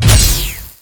Plasmagun Metal, Damage

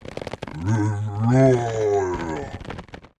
BLAZE BLOCK PEACE IDLE

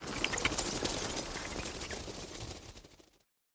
Bat, ambience

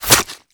Sword Skin, Damage

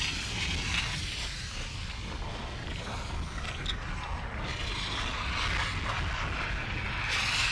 Steam Rumble, ambience

